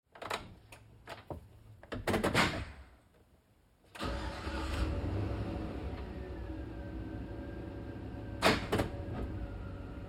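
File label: Truck GMC Savana Interior Drive Accel Decel Slow; Trucks; Big Room Sound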